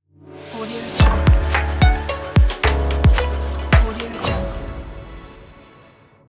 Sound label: intro logo